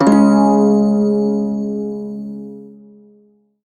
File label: Prime Shawzin Chromatic Chord, Music; Warframe